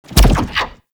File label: Infested Lich Virulence Impact; Warframe; Abilities; Zeke